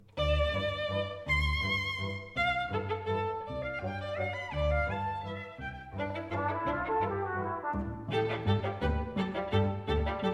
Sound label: valse